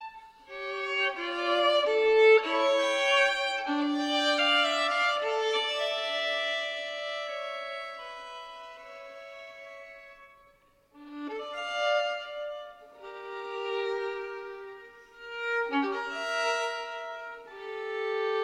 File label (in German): partita ii d moll bwv 1004 sarabanda